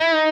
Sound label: bend half release